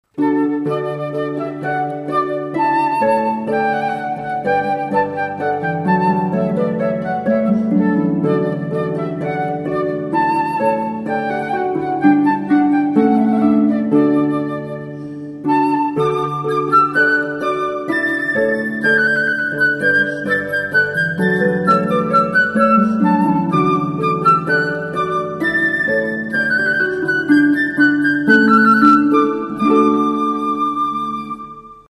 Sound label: prelude, harp